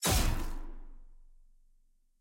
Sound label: aah; viego